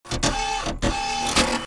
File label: Servo Movement; Machines